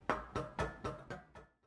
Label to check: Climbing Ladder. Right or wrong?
Right